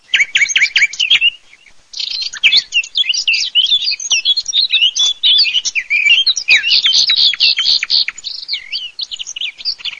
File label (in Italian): CAPINERA USIGNOLO